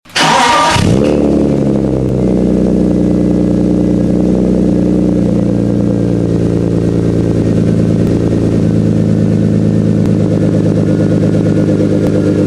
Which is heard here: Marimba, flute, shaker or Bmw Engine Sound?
Bmw Engine Sound